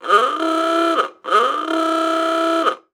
Transportation Car Ford Model A Honk Horn Multiple